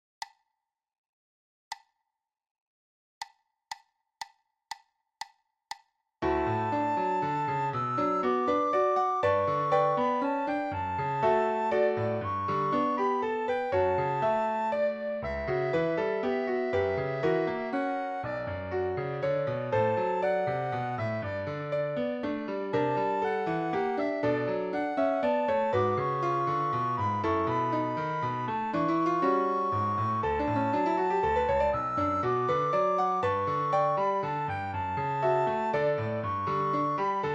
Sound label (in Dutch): sopraan; Blokfluitles